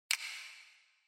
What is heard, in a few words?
Finger Snap Foley Reverb